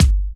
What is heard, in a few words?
Soft Kick; Vengeance Samples